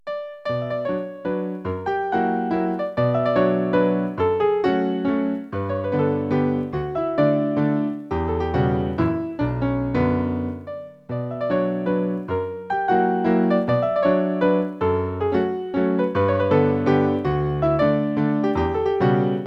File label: MAZURKA